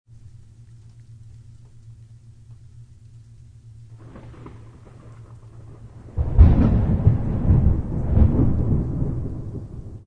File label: T-STORM